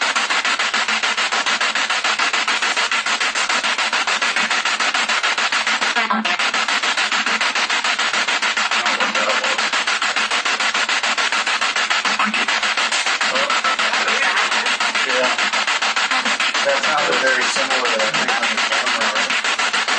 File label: Kitchen Spirit Box; private residence freedom road apartment investigation